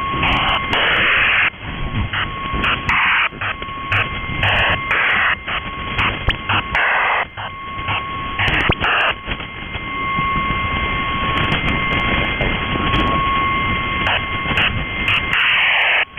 Link11 SLEW serial modem 2k4 PSK8 MIL system

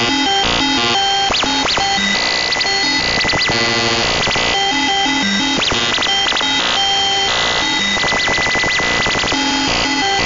Chinese multitone on 21 MHz A3E

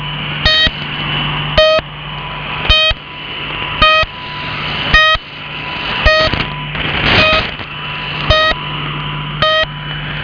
Russian MIL channelmarker on 3756 kHz AM

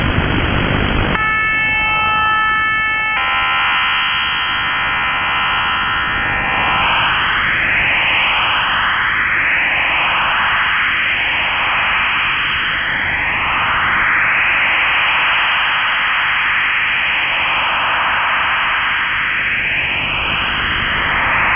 MIL188-110A QPSK hybrid modem on 14002 kHz; ISR Navy system

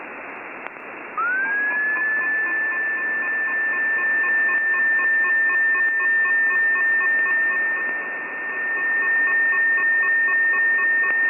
Gabonese carrier and dots on 28345 kHz LSB-USB

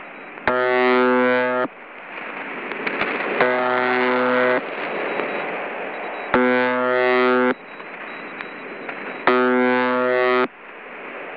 Russian buzzer on 7 MHz AM

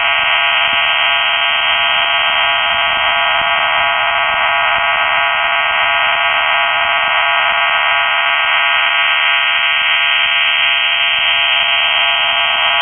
Russian MIL MPSK16 (+1) FDM 16 x 75 Bd PSK

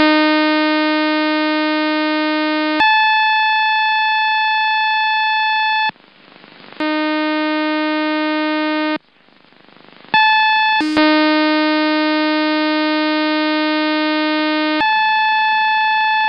Iranian Radar on 28 MHz AM

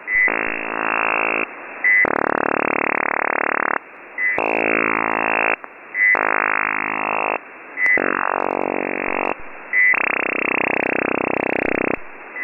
Australian JORN OTH Radar

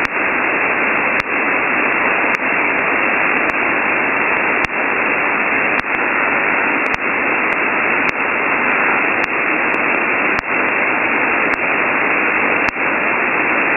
Pasture fence on 3.5 MHz

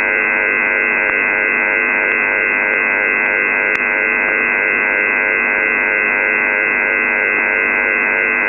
Plasma TV on 3.5 MHz (USB)

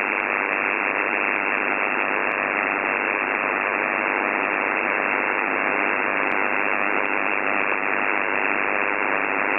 LED power supply disturbing 7 - 21 MHz

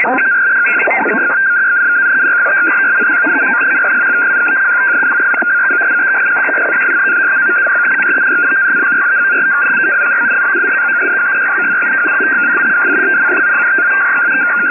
Russian MIL Yakhta voice scrambler on 28347 kHz

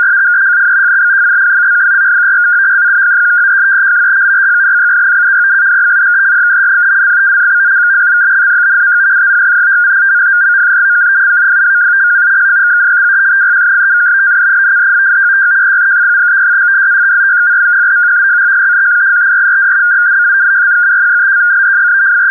Russian Yakhta synchronous FSK 100 Bd 150 Hz

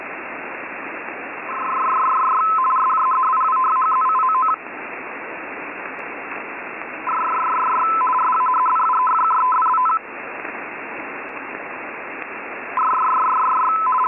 F1B bursts 28100 kHz 51 Bd; Portuguese mysterious

